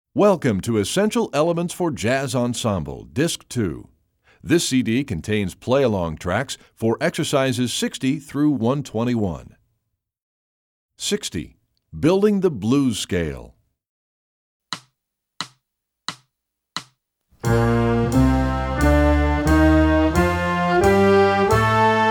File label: building the blues scale